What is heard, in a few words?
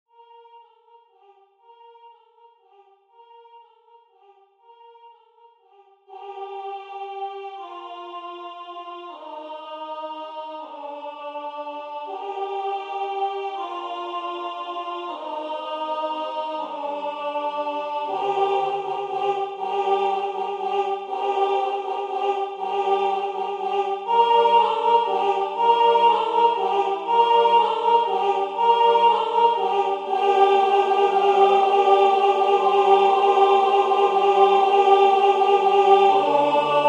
Carol of the Bells Alto; Ukrainian Christmas Carol; Arrangement by Peter J. Wilhousky